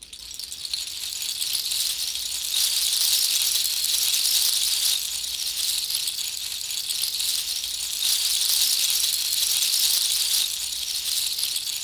Sea Shells Loop, shakers

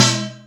BIN, INDUSTRIAL

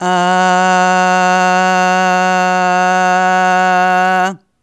Aah Long F#2 Dry; Harmonic Building Blocks

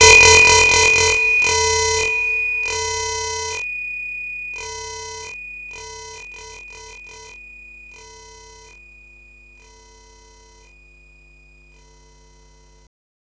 FX, Drum & Bass

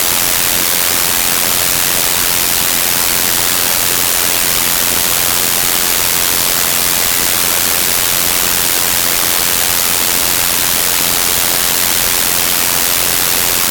tuned ring mod noise

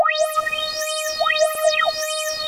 ATMO, transwaves; Soundengine - CSR-000 The Art of Transwaves; HARD MOD

